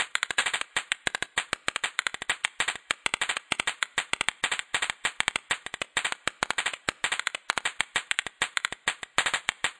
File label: 098 Found Percussion; 074 to 128 bpm; DRUM LOOPS and BREAKS